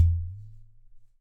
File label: Udu Booyoo